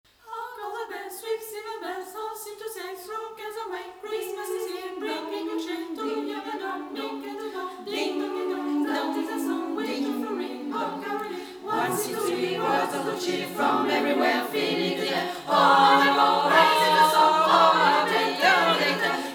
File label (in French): chant, choeur